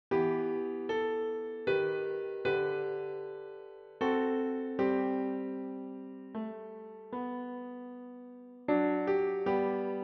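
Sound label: piano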